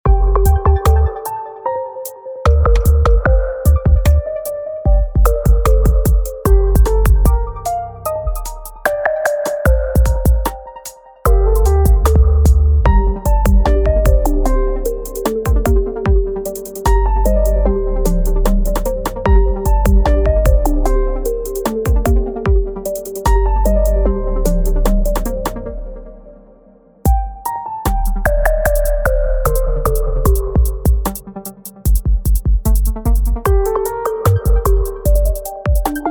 dark drill grime

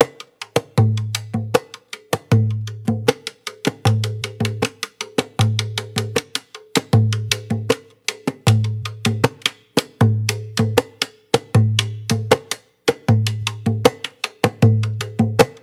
tam tam loop 156 mic a

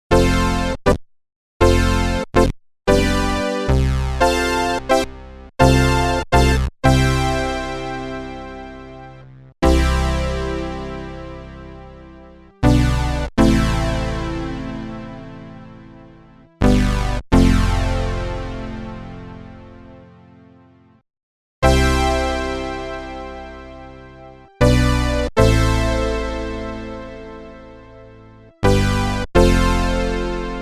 brass wet chorus

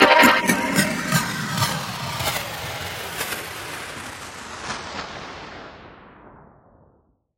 club impacts sound

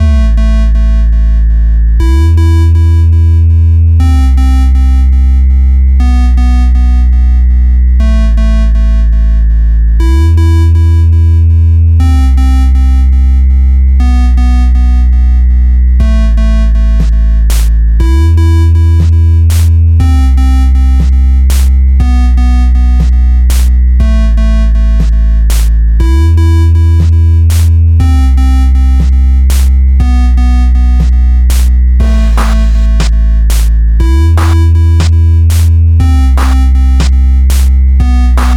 famidrums

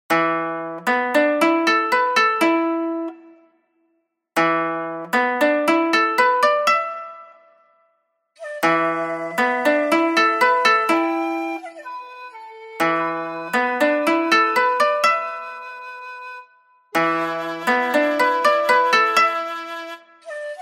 koto staccato

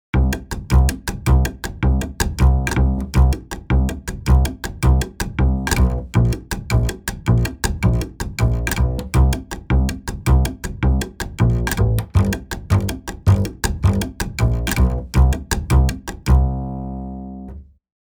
roots urb cajun

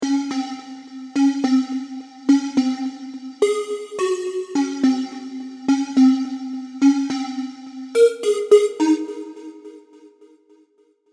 serum synths; chiqui audio

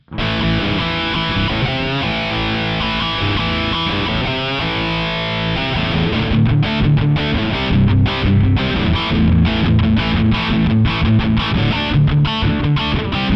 gibson rock

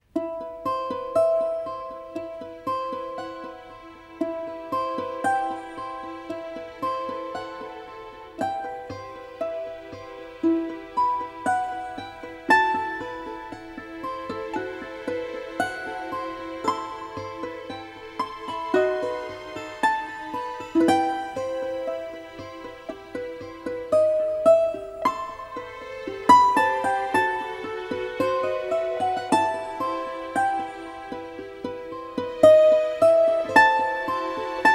balalaika sorrow

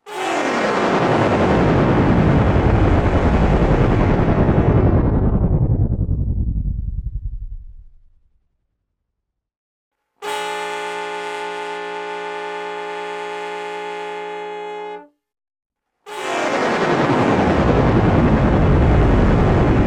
H910 harmonizer; Eventide

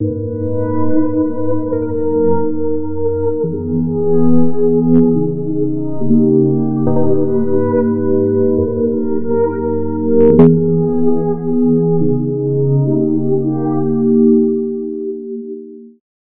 pad; crypto gateway

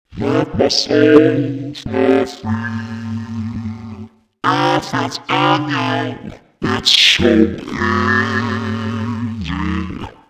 demonized

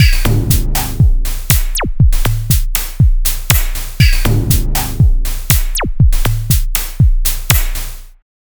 drums bank; proii

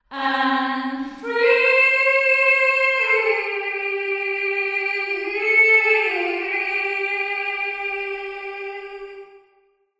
echo chamber church reverb

